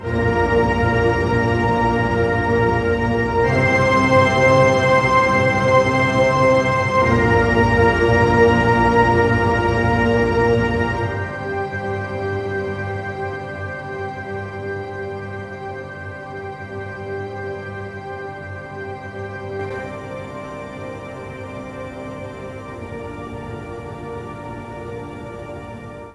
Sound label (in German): Orchester Spannungsmusik